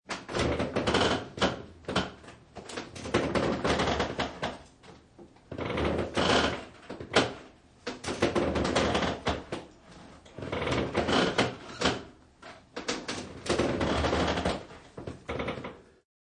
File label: Low, heavy wood creaks; Creaking